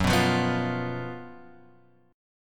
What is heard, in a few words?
F sharp Major 7th Suspended 4th, guitar; chordshape; down